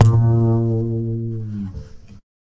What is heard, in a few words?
Upright Bass Notes